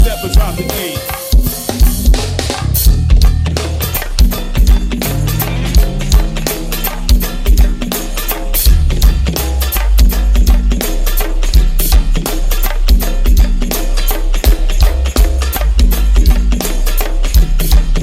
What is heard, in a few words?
Pocket Groove